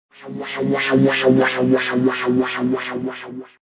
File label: UFO flyby; aliens